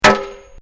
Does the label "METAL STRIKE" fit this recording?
Yes